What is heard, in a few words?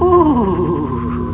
uh uh uh